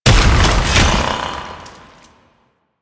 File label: Fusion Pillar Impact; Warframe; Qorvex